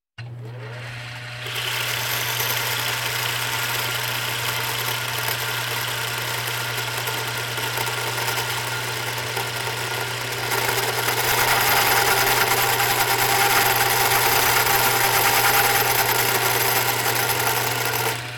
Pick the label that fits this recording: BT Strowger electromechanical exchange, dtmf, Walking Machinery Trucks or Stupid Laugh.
Walking Machinery Trucks